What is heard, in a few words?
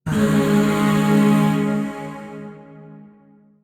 Shawzin Yo Chord B; Warframe; Zariman; Void Shawzin; Chords